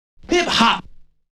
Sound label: FX and Scratches